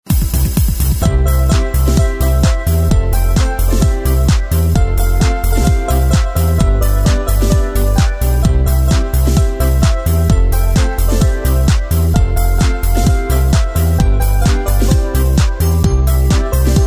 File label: jingle bells